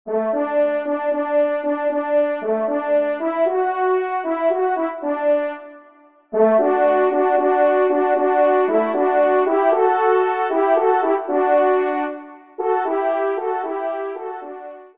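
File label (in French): duo, ensemble, trompe cors; Kling; duo cors n 17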